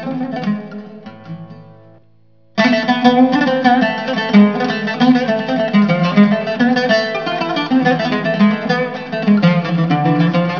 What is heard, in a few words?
taksim, oud